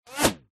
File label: bullet wizz by incoming whine threatening waspish; skyclad sound